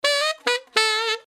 brass loop

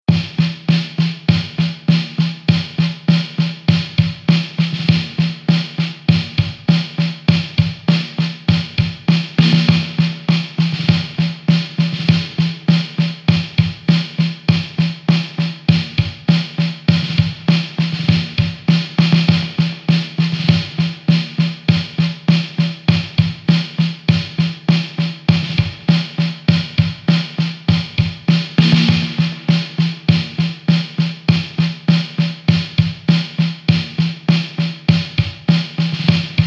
A100 Rocky Riff

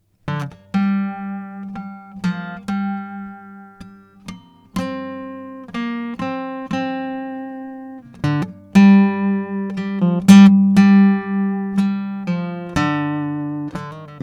Gm120 GTR impro

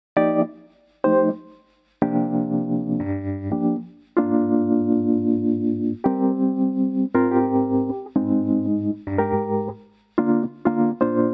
Piano

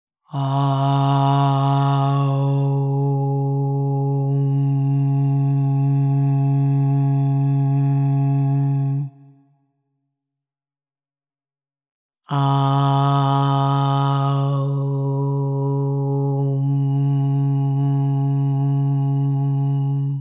OM 136.1 hz Reverb; MixterPlus

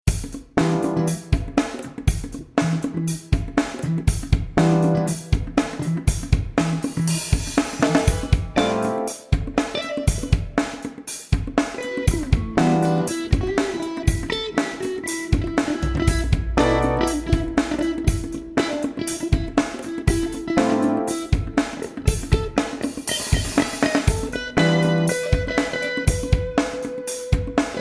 Em 120 funky groove

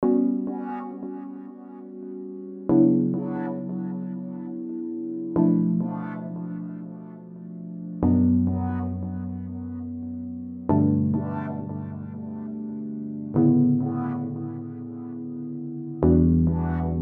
Retro Groove

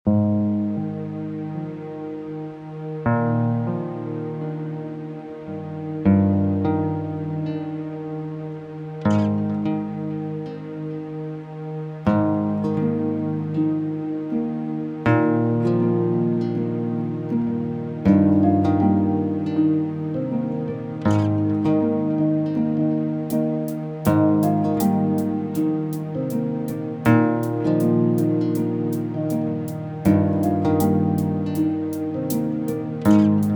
sinewaves; airtone

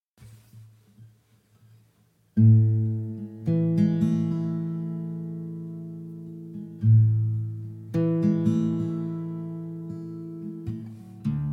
A 108 GTR arp delay

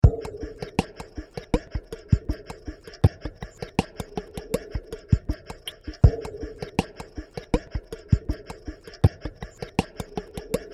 beat 16-bar 80 bpm; Mixter Plus Deep Roots beat pack

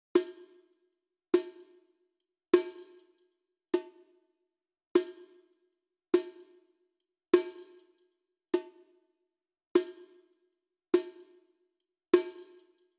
Perc

